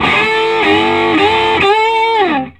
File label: Vintage Blues Guitar, SOLO